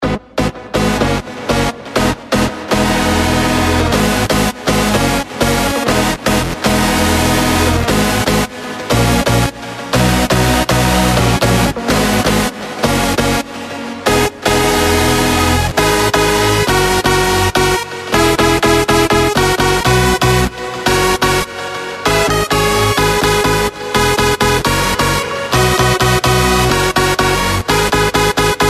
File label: RAVE SAW